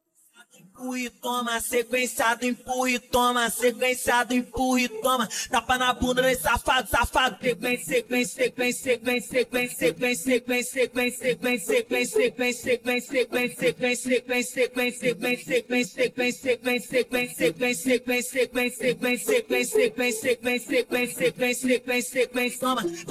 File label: funk brazilian, vocals